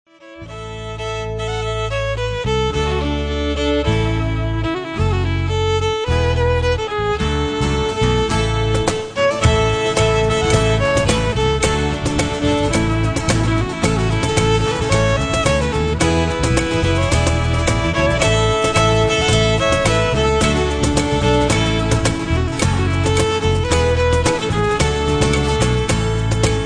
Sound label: Cello